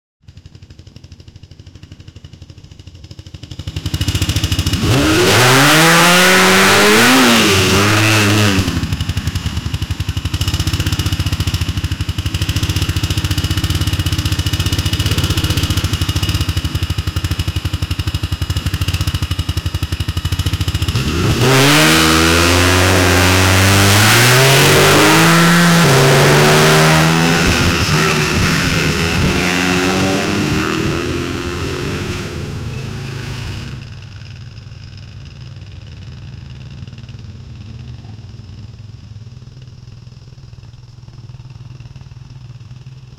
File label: sound of hero glamour, motorcycle